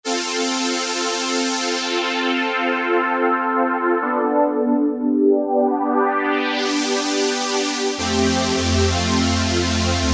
Filter Strings, Korg microKORG; Factory Voices

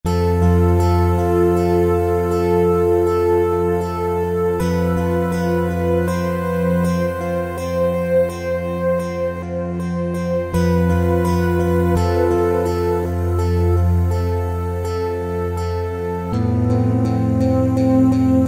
Stratosphere, Kurzweil K2000; Kurzweil K2000 V3

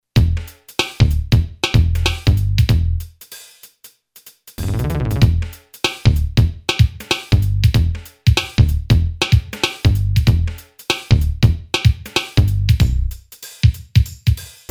HIP HOP, Boss DR-3

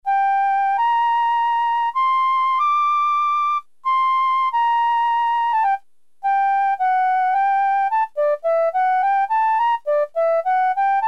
FLUTE, Casio CZ-1000; Preset

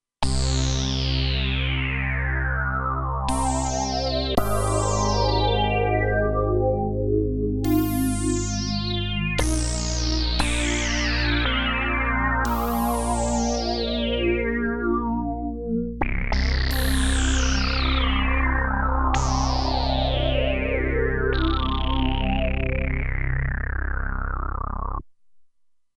Synth Sweep, Roland Alpha Juno-2; Original Sound Chart patches

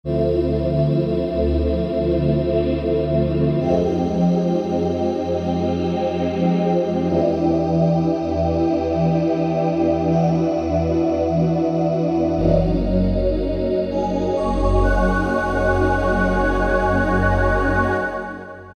Form2; Generalmusic Equinox

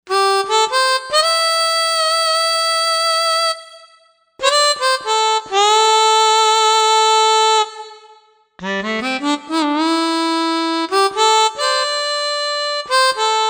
Harmonica, Korg M1; Factory Patches

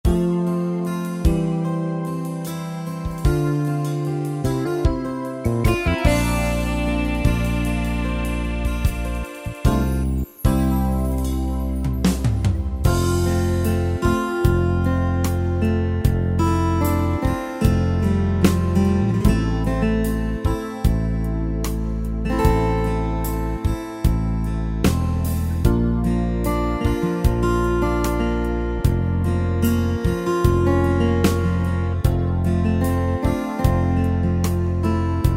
Soft Rock; Pop & Rock

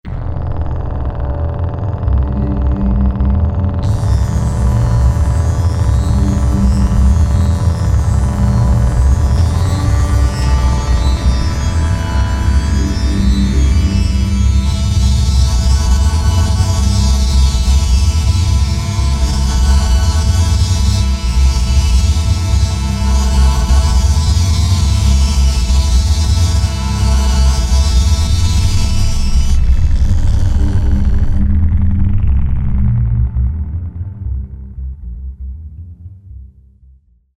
Ambient, Alesis Rap Techno Dance; Mix

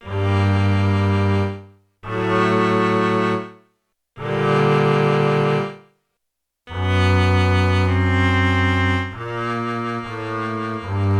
Bass & Cello

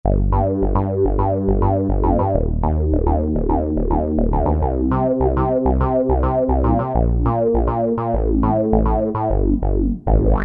Mogue Bass, Kurzweil K2000; Kurzweil K2000 V3

